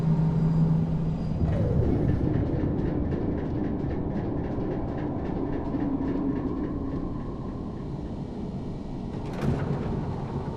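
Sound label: ambience exterior Above The Dome Pillar Cavern; Boomtown; Titanfall 2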